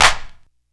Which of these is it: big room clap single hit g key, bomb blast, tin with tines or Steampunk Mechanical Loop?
big room clap single hit g key